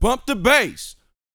VOX F, Vocal, R&B Dance; Spectrasonics Vocal Planet

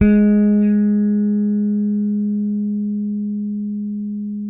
Bass, soul G#4; Spectrasonics; Bass Legends; DEEP-SIX

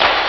hall clap; ST-XX Amiga Soundtracker Sample Packs

